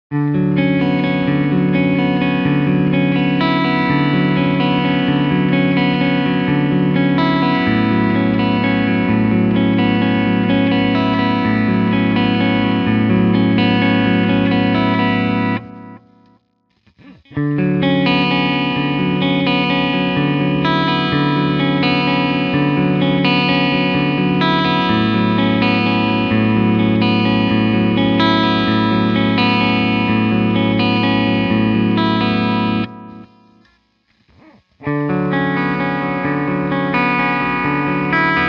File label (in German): Cleanpicking Front beide